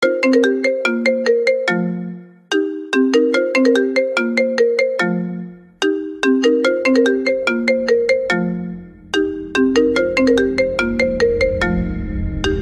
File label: iPhone 5C; toque de celular